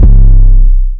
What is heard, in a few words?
wide 808; 808s